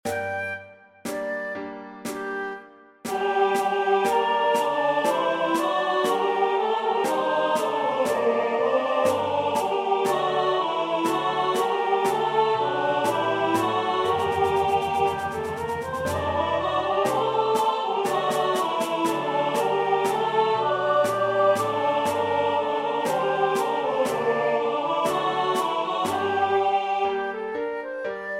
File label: Alto; Patapan; Christmas 2025